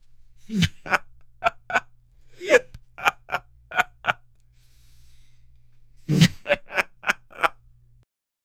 laughing man sound; laughter

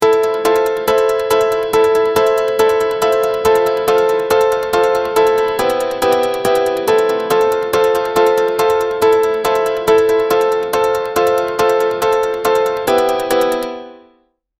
pretty sound guitar, 140 bpm

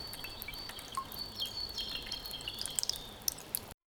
gentle water pouring sof; bells

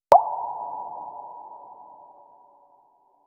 a single water drop falli

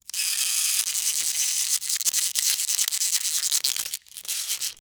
tinkering sounds tapping, crafting

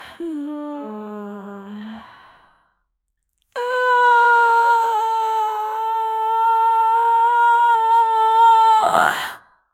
sound effect low pitched, creepy

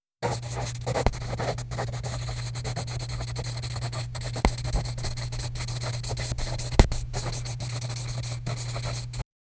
soft pencil scratching; classroom

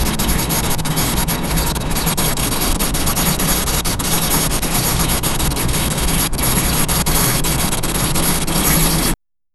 pencil writing drawing

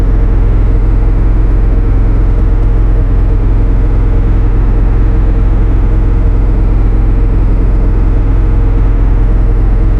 seamless loop sound effect, truck

